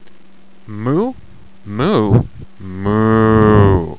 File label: moo